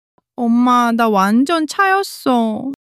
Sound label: crying